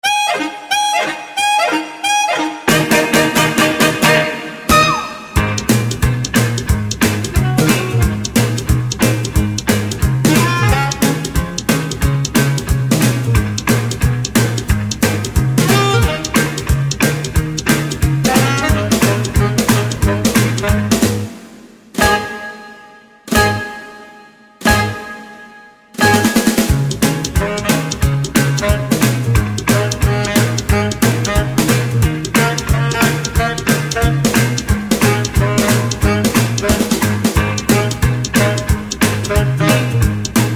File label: (Ab) No Backing Vocals